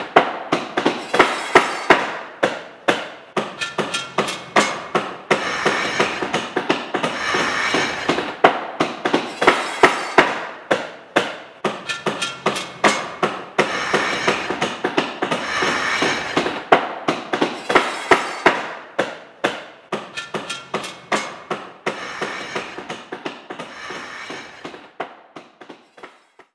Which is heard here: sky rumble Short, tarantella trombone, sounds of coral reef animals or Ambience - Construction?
Ambience - Construction